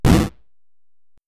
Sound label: hit